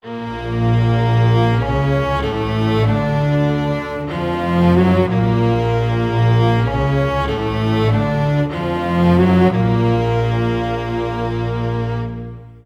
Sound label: 95 Bb min lower bass, Low Strings; PowerFX - Downtown Orchestra